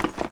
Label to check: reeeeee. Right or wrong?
Wrong